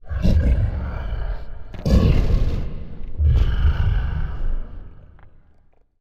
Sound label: giant monster breathe